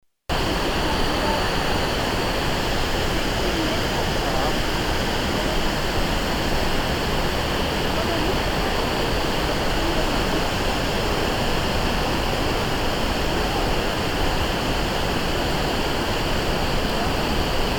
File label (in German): Rheinfall zu Fuss